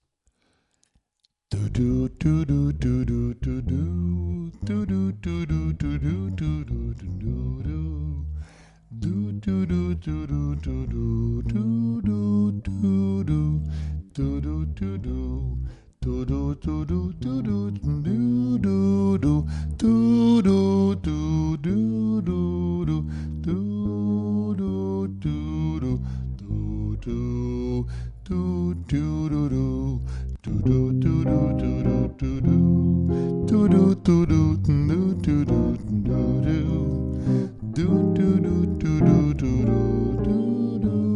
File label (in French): Basse